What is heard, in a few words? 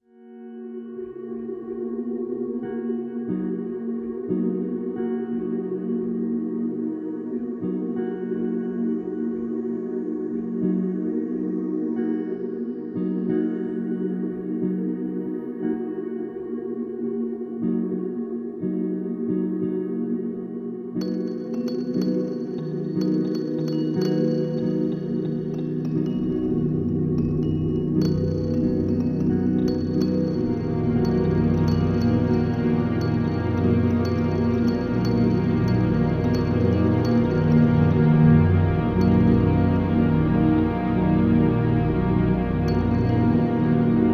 Beetlecrab Tempera Improvisation